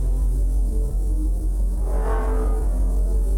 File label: gambler wait; Magic Stars 5; Wazdan